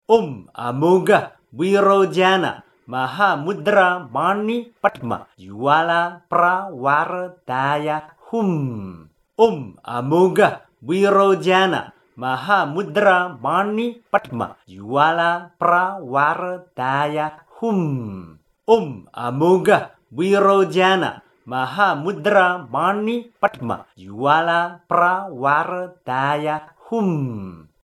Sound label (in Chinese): 光明真言(直誦)